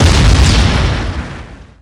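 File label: Explosion Large; SFX Kit; Destruction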